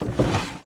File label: Drawer Open